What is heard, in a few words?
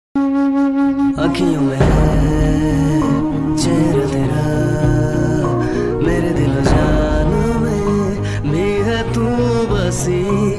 movie bgm ringtone south movie ringtone instrumental ringtone; sita ram